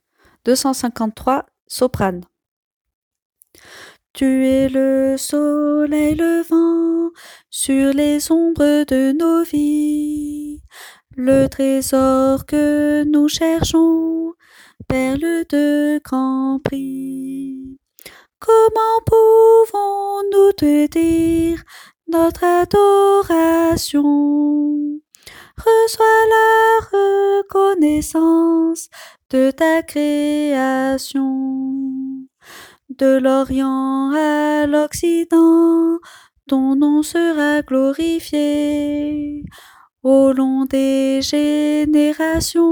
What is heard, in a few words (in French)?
soprano